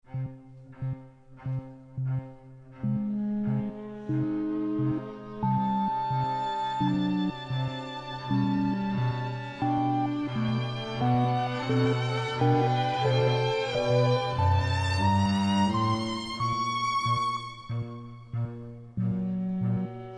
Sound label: Sync Sine Soni